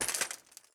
glass broken footstep

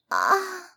Moan; My Cute Commissar

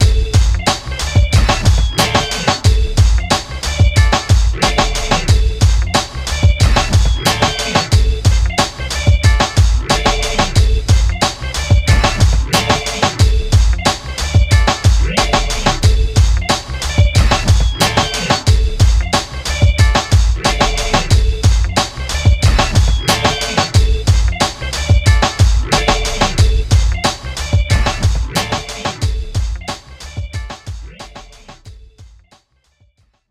Old School Beat; NBA Live 06; PlayStation 2; NBA Live 2006 Beat

